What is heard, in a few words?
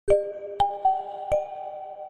Notification Ringtone; Vivo X100